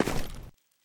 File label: mud, footsteps; Counter-Strike Global Offensive